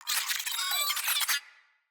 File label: Space Drone Pain; Corpus